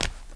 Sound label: Footstep Linoleum L